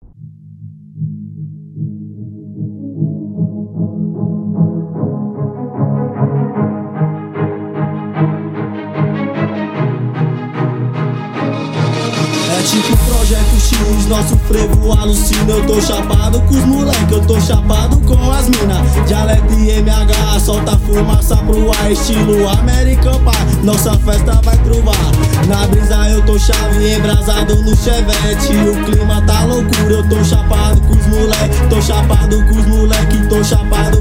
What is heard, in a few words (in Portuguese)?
rap